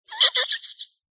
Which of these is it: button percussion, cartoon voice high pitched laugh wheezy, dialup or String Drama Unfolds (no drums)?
cartoon voice high pitched laugh wheezy